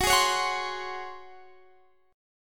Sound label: C sharp Major 7th Suspended 4th F sharp